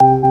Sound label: ST ORG C3, ORGANS, House Side Of 2 Step; USB Soundscan vol.51; AKAI